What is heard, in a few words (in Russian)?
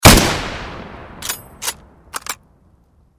zvuk vintovki mosina